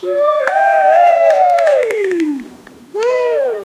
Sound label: light rustling tiny work